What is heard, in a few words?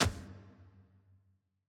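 ambience room polygon punchbag training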